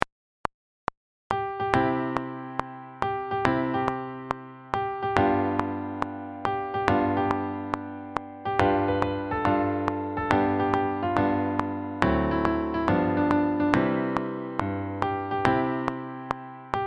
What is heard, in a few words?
color chords metronome